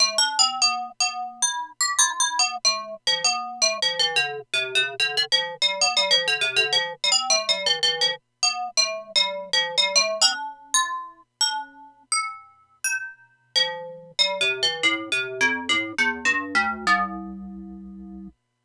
ringmod bell test, reface cs

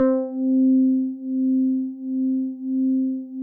cs e piano, yamaha reface

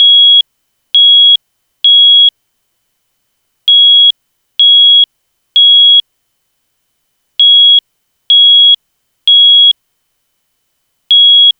smoke alarm

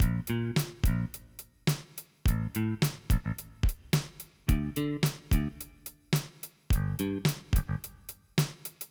bassline, yamaha reface cs

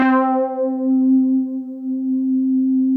cs synth lead, yamaha reface

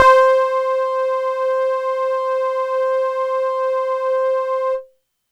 fm sawlike piano, yamaha reface cs

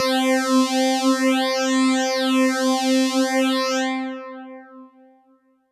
chords, yamaha reface cs

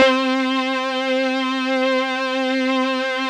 analog vibrasaw, yamaha reface cs

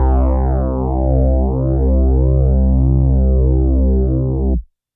bass, yamaha reface cs

